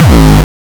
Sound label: 909 Dist Reso Crushed - G#1, Kicks; Drums; Mbase 11 Kicks